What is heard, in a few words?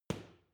boxing paw hit soft; boxen